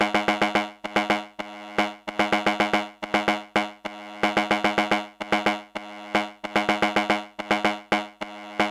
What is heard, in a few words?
psr 37 beat; Circuit Bending a Yamaha PSR 37; Beat Patch 3